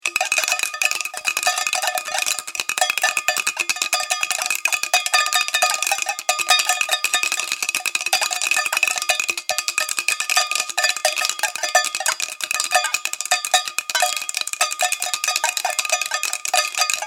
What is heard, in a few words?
Tin Cans, Tin cans rattling together.; Clanks; Objects